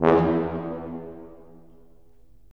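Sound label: brass tuba; LA Composer vol. 3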